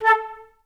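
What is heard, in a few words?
flute staccato A3; Best Service; Peter Siedlaczek's Advanced Orchestra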